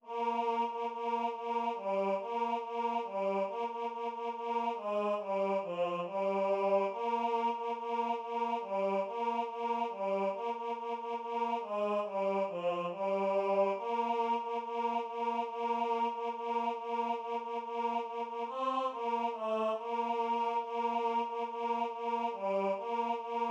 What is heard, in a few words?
Tenor; Deck the Halls